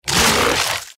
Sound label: boss death